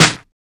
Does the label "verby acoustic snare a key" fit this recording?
Yes